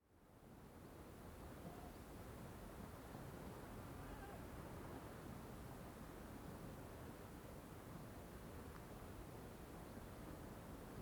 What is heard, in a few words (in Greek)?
Δευτερεύουσα Πύλη Φυλακών Χειμώνας; Δευτερεύουσα Πύλη Φυλακών Χειμώνας (ΗΧ); Filakes Back apo Mnimeio